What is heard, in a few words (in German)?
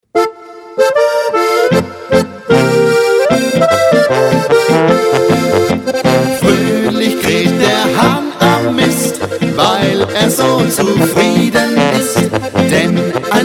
Gockelhahnpolka